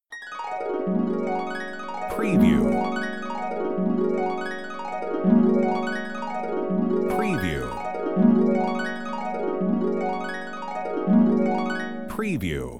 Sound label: dream harp